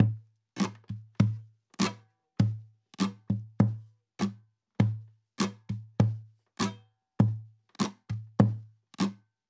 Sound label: guitar percussion 100 bpm; percussion loops; bespoke - Floral v.1